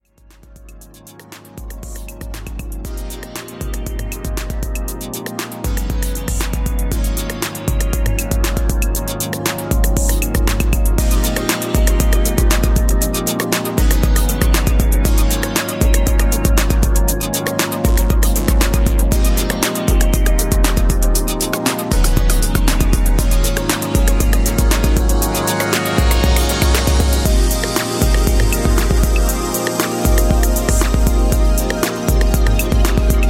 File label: Strings